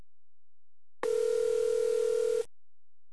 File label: ringback; i-mobile 5511 3G; prompt